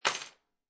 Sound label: Keys Drop On Wood Table, Foley, Keys Dropped Onto Wooden Table; Drops; Objects